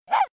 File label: Dog Bark